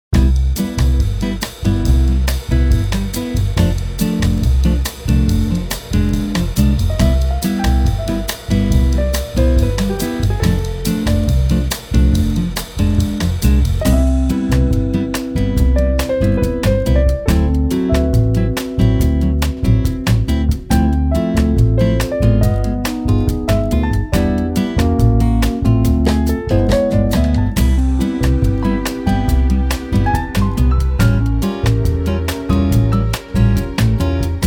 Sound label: music